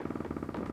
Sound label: Household Bed Creaks